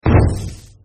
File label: sound bomb